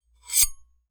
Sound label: Metal; TOOLKIT vol.2; DET24 ElementalMysteries